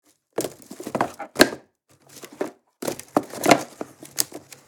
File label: Picnic wicker basket packing food